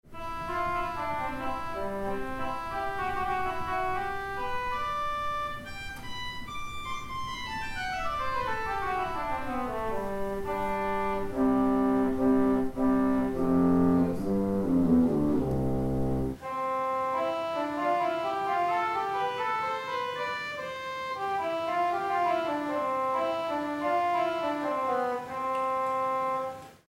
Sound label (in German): Gamba, rundfunkorgel